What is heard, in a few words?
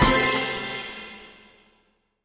big win increment ended; Fishing For Gold